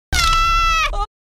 screams; brook

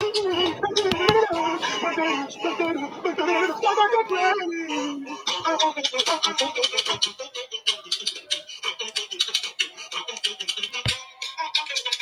beatbox; fnaf

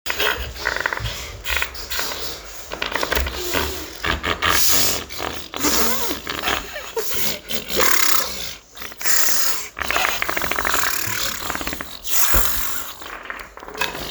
fart noises long

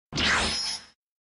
anime teleportation

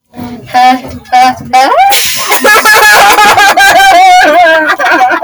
heh heh huhuhuheuheuehuhe

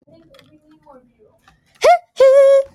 hee heeeee

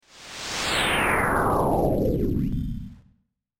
old os whoosh

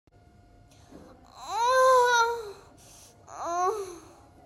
aaaaaaaaaaaaaaaaaaah

